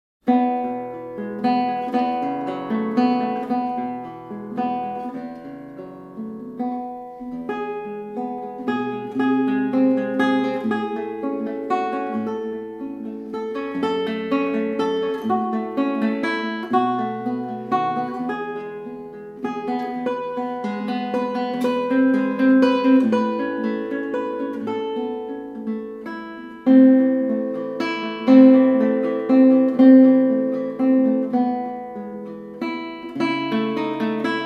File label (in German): Walzer